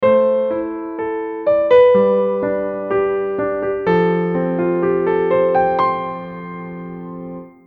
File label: RingTone